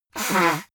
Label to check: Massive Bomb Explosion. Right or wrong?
Wrong